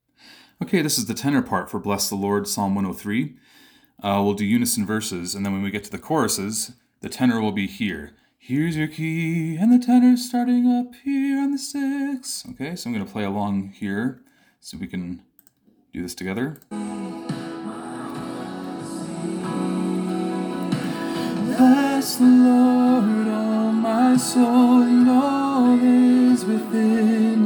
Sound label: Tenor, gospel choir tracks